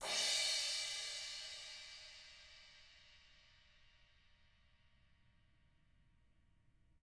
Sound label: ZILDK BRCRS